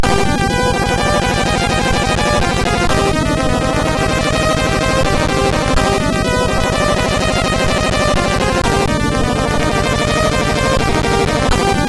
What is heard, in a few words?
sid MOS 6581R2; STII8